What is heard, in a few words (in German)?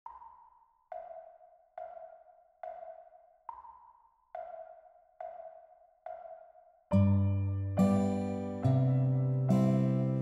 Backe backe Kuchen, gitarre, kinderlieder; gitarre guitar